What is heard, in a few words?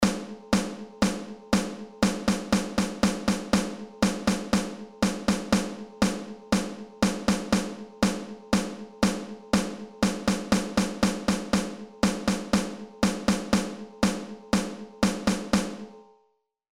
Rhythms Snare Drum